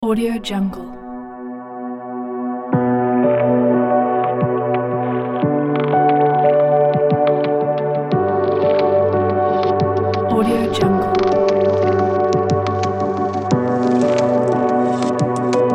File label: inspired tech electronic